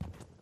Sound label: foam floor